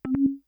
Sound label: ship change; spacetac